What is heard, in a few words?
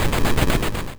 noise; ロケット噴射音っぽいの